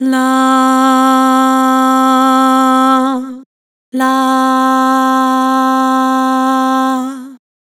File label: Vibrato "Lah", Harmor Vocals